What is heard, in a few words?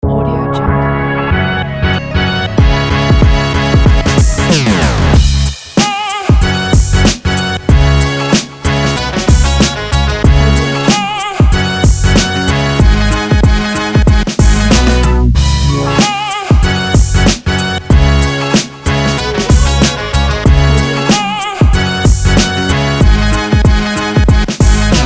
hip hop upbeat